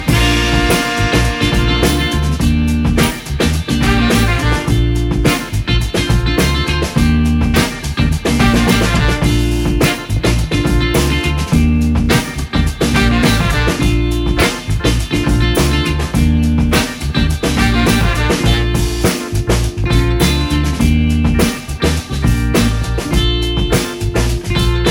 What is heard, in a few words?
instrumental